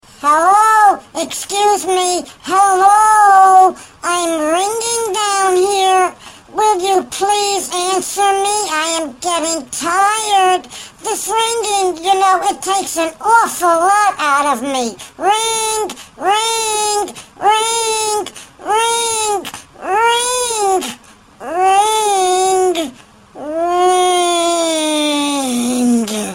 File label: Kids Call